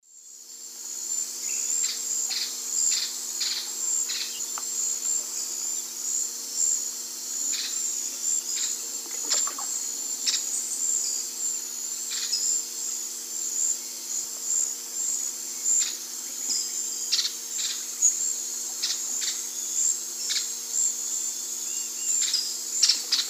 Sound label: Thripophaga fusciceps, calls pair; S. America; Eastern Lowlands